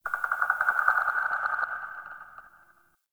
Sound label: Tree Critters Scared; Warframe; Duviri; Ambience